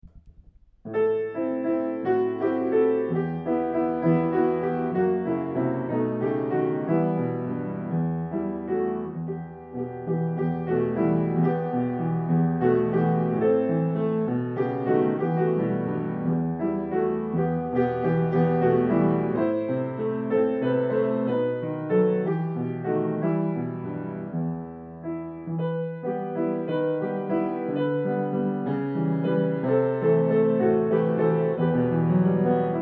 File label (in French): piano